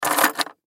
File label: Key Ring Set Down; Fast Table; Set Downs; Objects; Blastwave; Foley